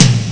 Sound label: synth tom; Amiga Soundtracker Sample Packs